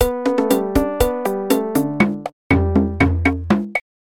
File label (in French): carillon